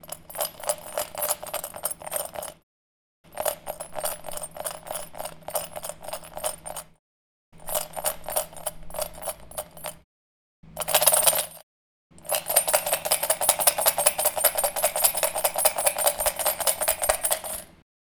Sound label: Piggy bank Shake